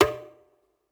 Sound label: conga; eddyflux